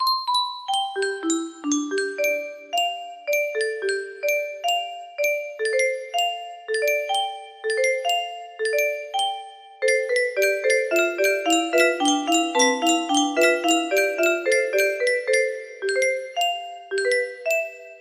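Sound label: main menu, music box melody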